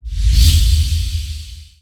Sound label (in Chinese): 死亡或消散